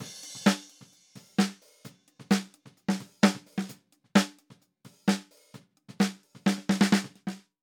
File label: drum snare